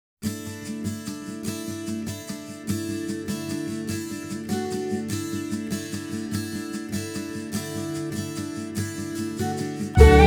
Carried on Rippling Waves, celtic; genso suikoden music collection celtic collection 1; Lakewest BGM

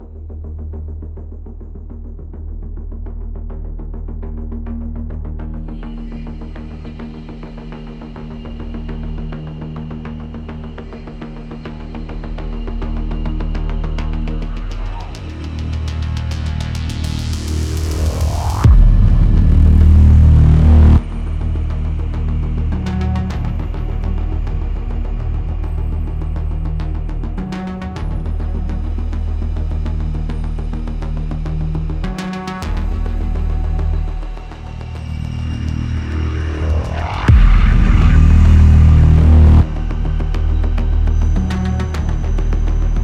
Main Ambient; ARC Complex - Samuel's Base

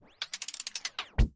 Ui Platforms Transition; doodle jump 2; android

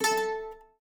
Savanna Ding; windows 7

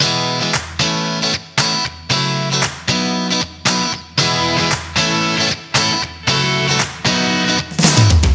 Music Dance Intro; Littlest Pet Shop Garden Jungle Winter; NDS

